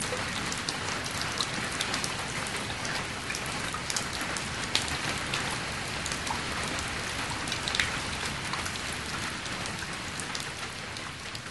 raining

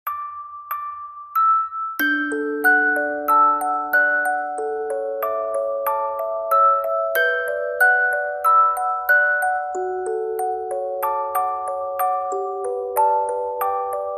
naruto music box

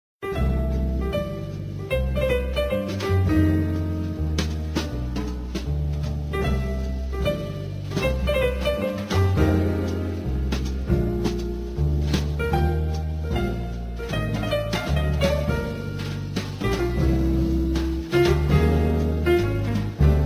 webex piano theme

